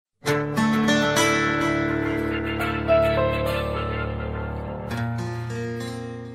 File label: Love Romantic Sms